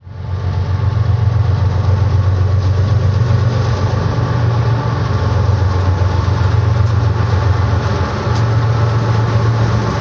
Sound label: train audio, sanyo xacti built